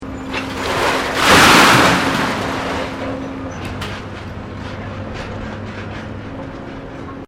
Industrial Scrap Metal Loading Drop, Scrape metal being loaded onboard ship; Machinery; Machines